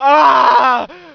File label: scream; hunger